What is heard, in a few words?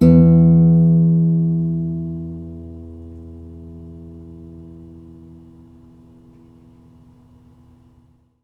Foley